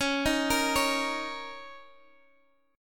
Bb Minor Double Flat 5th, chord

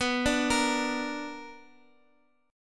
B Minor Major 7th, Guitar, Drop D

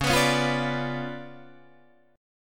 C sharp 7th Flat 9th Guitar Open D, chord

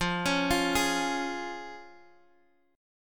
F Minor Sharp 5th, chord; x,x,x,x,5,8,7,5

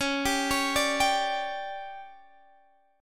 D Major 7th Suspended 4th, chord

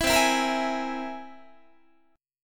C Augmented 7th, Guitar; strummed; JGuitar

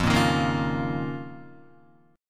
F 7th Suspended 4th Sharp 5th F down Guitar; F7sus4#5 strummed; JGuitar

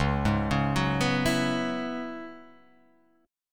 Db Minor 11th Db; Dbm11 arpeggiated; x,4,6,6,6,6,6,x; JGuitar

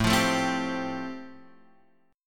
A-Minor 7th Sharp 5th, chord; x,0,3,2,1,3; Am7#5 strummed; JGuitar

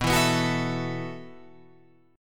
B 7th Suspended 4th B down Guitar Open F; B7sus4; strummed; JGuitar

